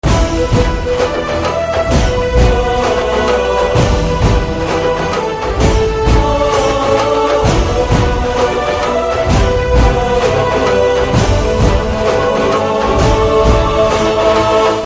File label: Title BGM Telugu Ringtone